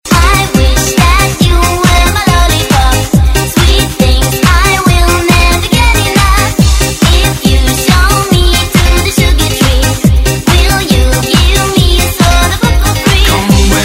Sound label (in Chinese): 劲爆节奏